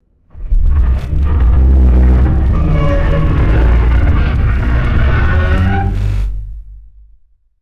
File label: deep rumbling with groaning creaks and screeches; creatures